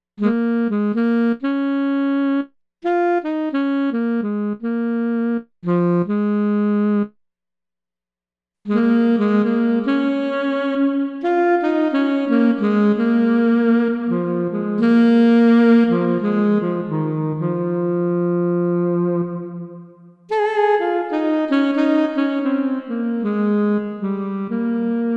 CLEAR PLATE

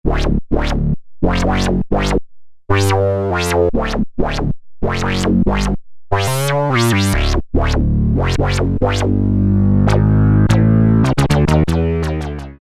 Spit Bass, Alesis Micron; Factory Programs

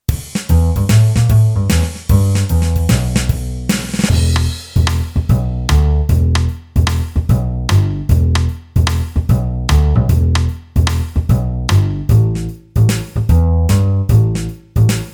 JAZZ, Boss DR-3; JAZZ FIVE

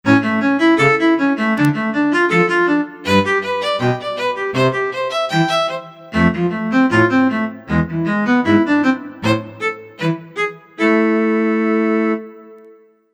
Arco Cello

